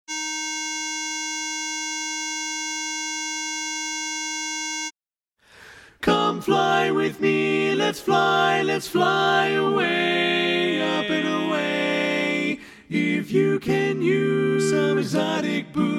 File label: Tenor